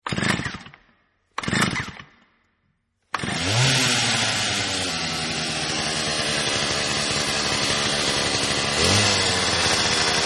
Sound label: Chainsaw; Halloween Horror